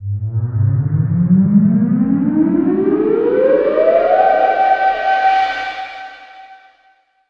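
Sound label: SYN right, Production Effects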